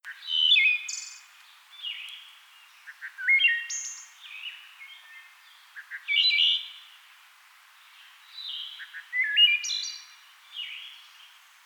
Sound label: Wood Thrush Hylocichla mustelina; thrushes thrashers